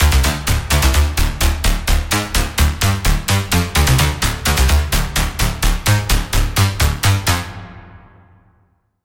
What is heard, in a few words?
Pluck - Unstabilized; Routed Sound; Sylenth1 Expansion; Chaos Bringer Vol.1